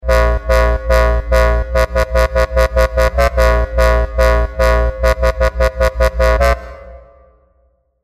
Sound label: COMP GARAGE BASS